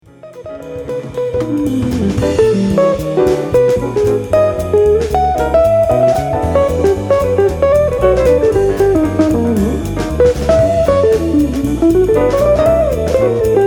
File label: Solo